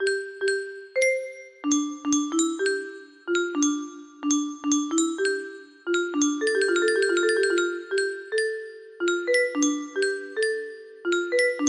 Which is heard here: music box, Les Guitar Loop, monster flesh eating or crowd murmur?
music box